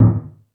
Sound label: FX Sounds, Drum Machines E-MU Pro-Cussion